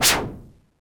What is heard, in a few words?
Whoosh, Korg DS-10